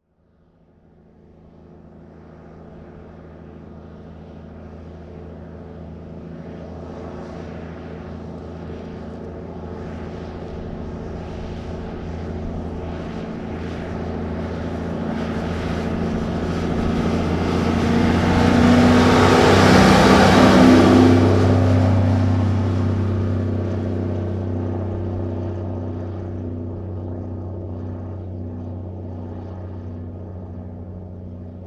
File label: 1954 Big Rig Ext Slow By; Period Vehicles